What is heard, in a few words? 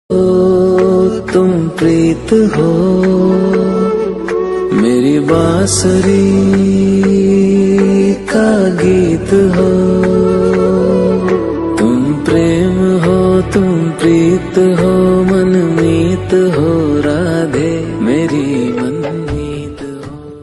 Bhakti Ringtone Krishna bhajan Ringtone Radhe krishna Tone